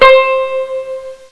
banjo high, string instruments; Sound Effects for Multimedia - Volume 1

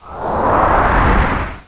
POWER UP; XWINGTIE

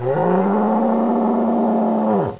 HURT; PC Gamer IT CD 31; DINK

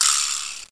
ZIP; MOBICLIC 95; ZOOMZOOM

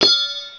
ric, weapons; Hexen2

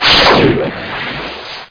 super zap; Arashi 1.1

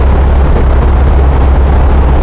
atmosphere; PC Gamer IT CD 41; Daikatana

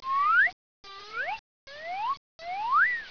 slide; 10,000 Graphics Pack Volume 1; Media Graphics International

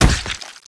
fruit gore; Army Men TiS

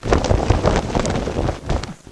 Scuffle; Princess and the Pea; SoftKey

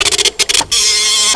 FAX

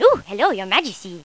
FRENCH MAGESTY; 3D Klondike v.1.0a

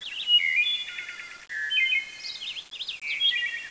sunbird

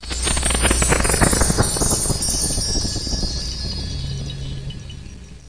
Power; blobwars

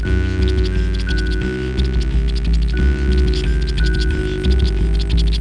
Robot

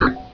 Hit Mothership; Bedlam 2 1.1.1; Bedlam Sounds

